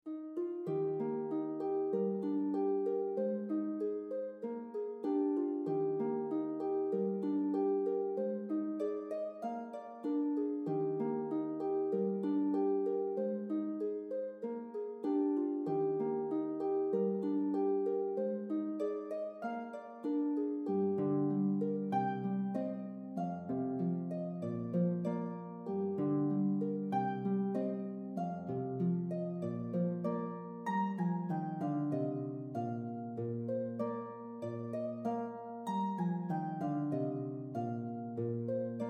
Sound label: Tune Children Playing Es Sib7